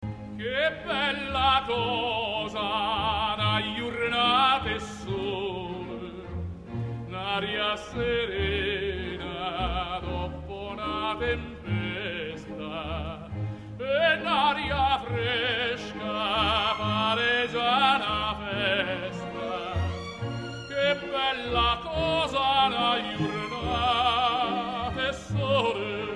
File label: O Sole Mio tenor